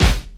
Warm Snare One Shot C Key, snare sound tuned to the C note. Loudest frequency: 992Hz